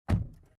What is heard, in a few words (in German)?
Auto Tuer